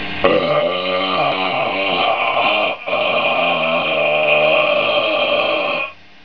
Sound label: Long burp; belch